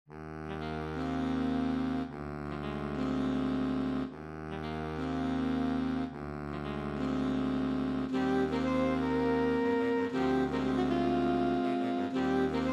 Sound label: Port Side Blues, sax